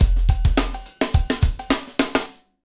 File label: Hip Hop, Drumscapes Roland; Northstar